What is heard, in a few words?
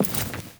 Walk Default, Footsteps; Enemy; Lady Deora